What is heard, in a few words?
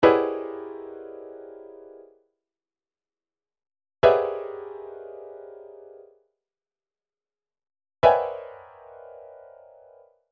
chord lines E, midi; Midi Files 1000